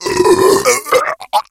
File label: death, infected; szf